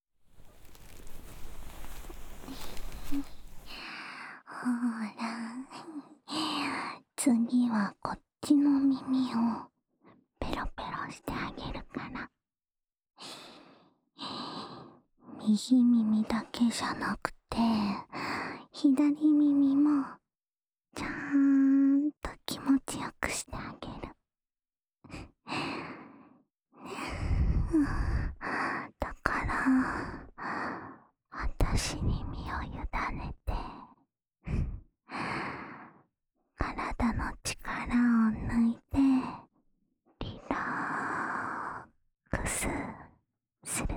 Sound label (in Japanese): 囁きながら耳舐め（左耳）, ASMR